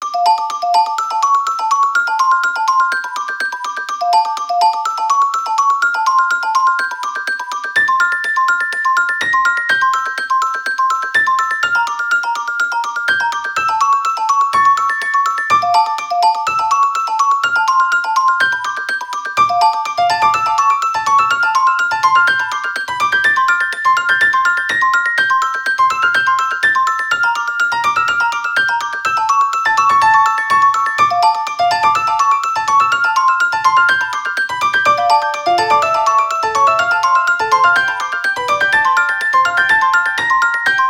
Grace Alarm; Sony Ericsson; Xperia X1; PlusFourSix; Venus; Lars Edvall

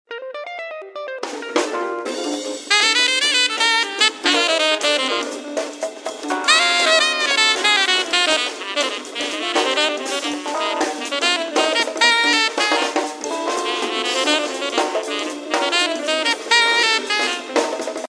Jazz Bar; Samsung; SCH-W259

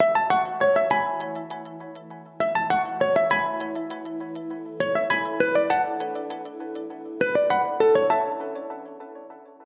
system ringtones abstract

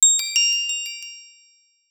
Structure alert; Sony Ericsson; PlusFourSix; SE Sound Project; Lars Edvall

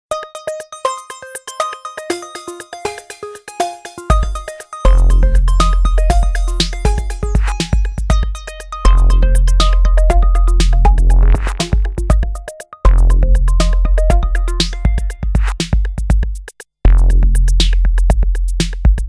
Nokia Tones; Windows Phone apps

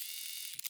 front camera mechanical popuped; Vivo iQOO-Z6

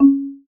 Marimba, notifications

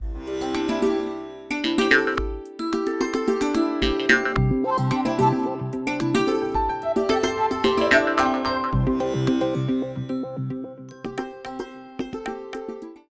Coconut Trees; Samsung Z2; Ringtones

